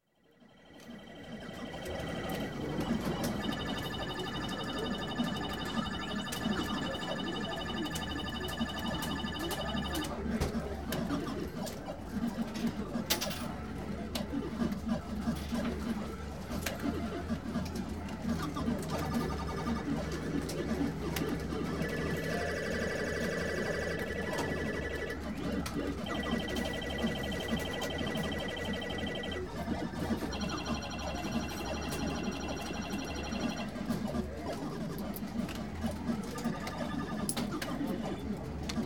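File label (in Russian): Игорные автоматы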